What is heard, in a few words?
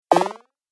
Retro Gaming Sound; Video Game Sound Effects